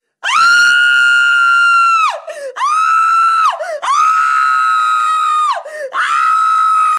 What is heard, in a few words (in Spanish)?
Grito de mujer